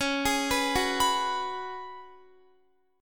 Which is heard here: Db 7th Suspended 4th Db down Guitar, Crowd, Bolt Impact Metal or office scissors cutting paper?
Db 7th Suspended 4th Db down Guitar